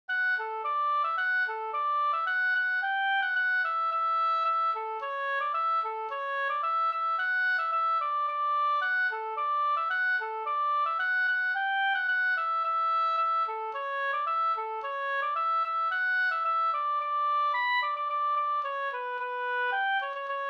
folkdans, Tretur no; Strandvik

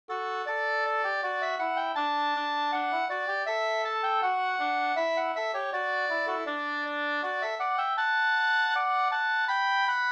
ganglat Marsch

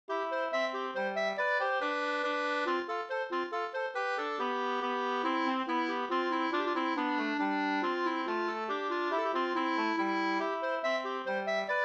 hambopolska, 2st

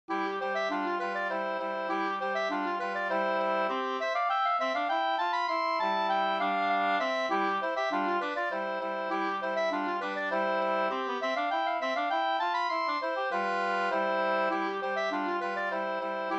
polska, 2st; Byss-Kalle

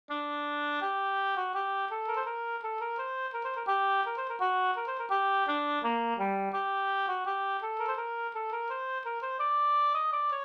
brudmarsch; Norrbottens brudmarsch av Olof Lagerqvist

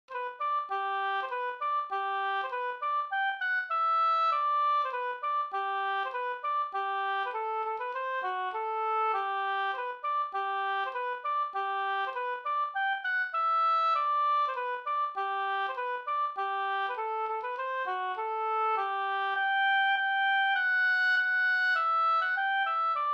folkdans; Rama fr Hogdal-Lommeland Bh

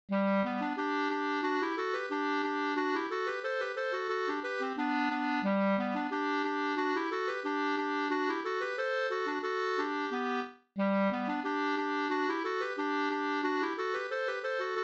engelska, 2st; e Fritz Oskar Brogren

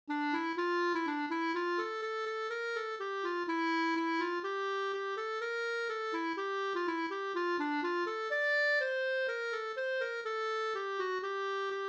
humppa

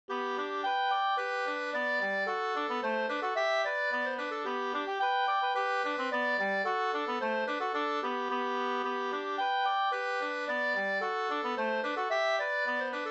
polka, 2st

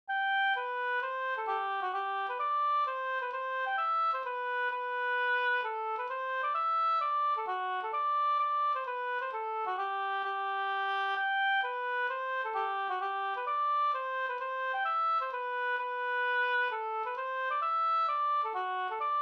hambopolska

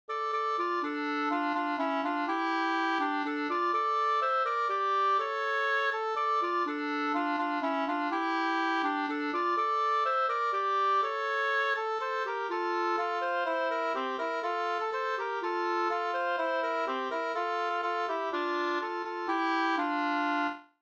visa; Lantzens julpolska

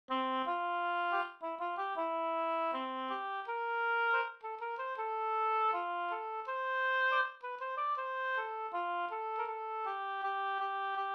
brudmarsch Brudlat Vasteras; K Sundberg

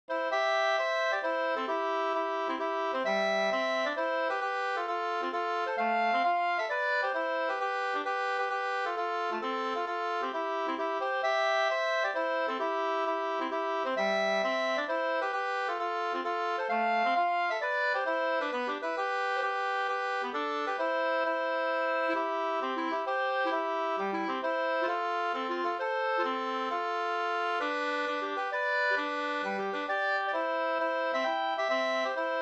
hambopolska

